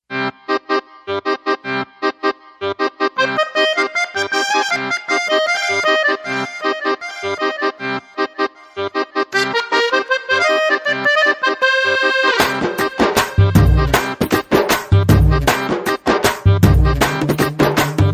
Piano Tone

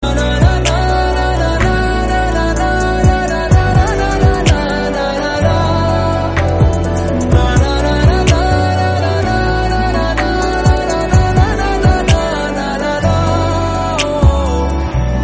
Romantic BGM

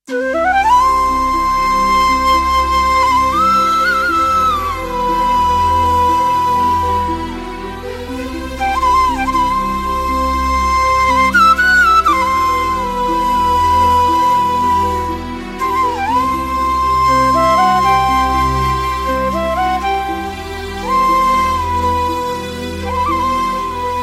Flute BG

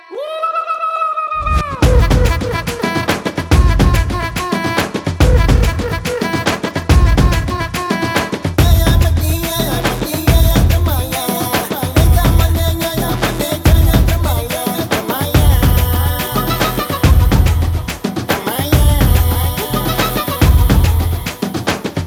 Drum Beats

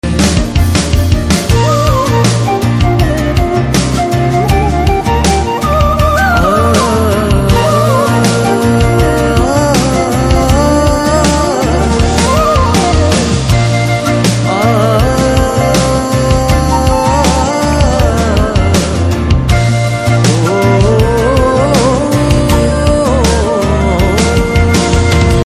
BG Tone with Humming

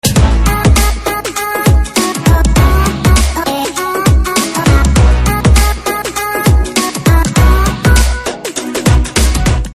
BG Drum Beats Ringtone

